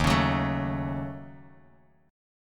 D sharp Minor 7th Flat 5th D sharp, down, Bass Standard; D#m7b5 strummed